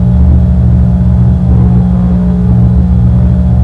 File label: energy